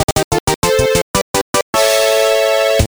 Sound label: Minigame Fanfare; Nintendo - Kirby Squeak Squad; Video Game OSTs